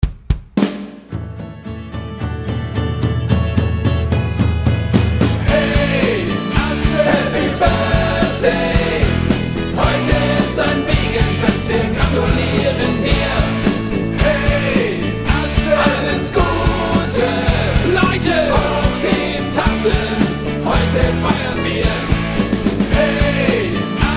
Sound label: Happy Birthday Atze